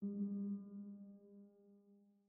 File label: Piano Artificial Reverb; Piano Nudes